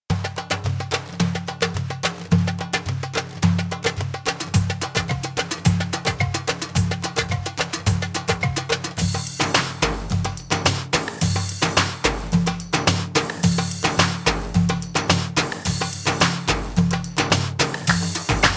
Instrumental

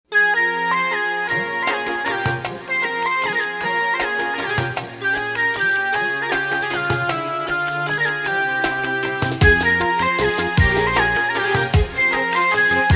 Instrumental

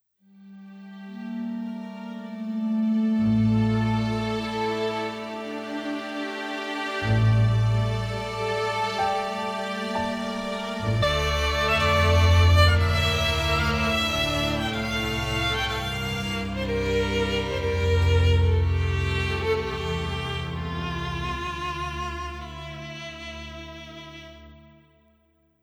(Instrumental)

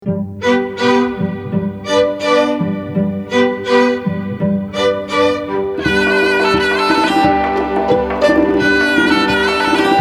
Orchestral; Mahoor

